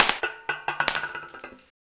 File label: Drop Tabla; FREE SFX PACK VOL 3